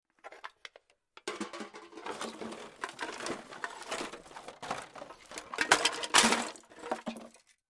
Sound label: Wood Search Through Garbage or Junk Pile